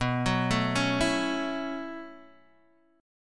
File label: B 9th Suspended 4th B down Guitar Open G